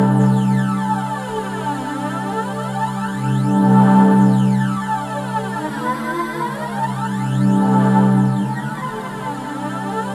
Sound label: C3, Synth Leads